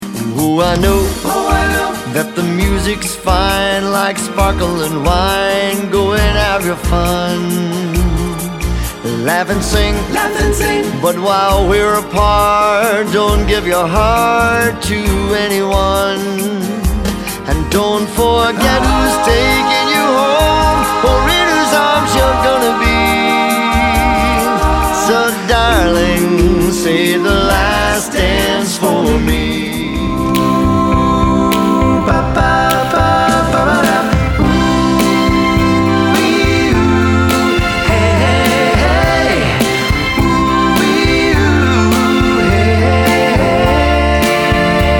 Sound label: Motown Medley